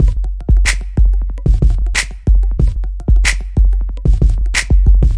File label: clicking snapping electro, drum loops and breaks, 93 bpm; 091 to 100 bpm